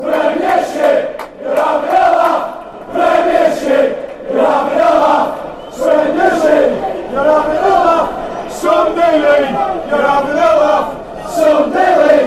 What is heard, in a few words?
yer having laugh, fanchants; premiership; lufc